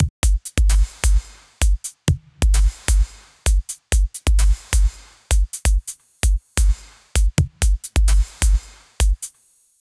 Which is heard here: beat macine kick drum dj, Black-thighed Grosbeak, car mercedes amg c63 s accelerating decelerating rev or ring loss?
beat macine kick drum dj